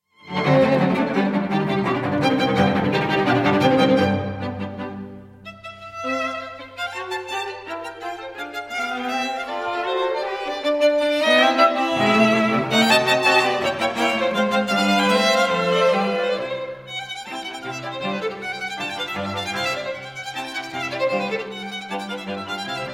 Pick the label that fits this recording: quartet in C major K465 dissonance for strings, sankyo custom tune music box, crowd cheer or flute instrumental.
quartet in C major K465 dissonance for strings